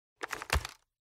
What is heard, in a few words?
draw; EMXArms; mg90